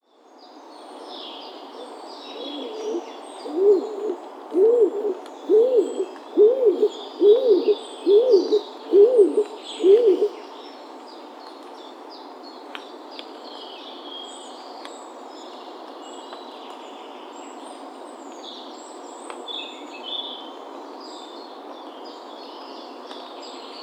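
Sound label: stock dove voice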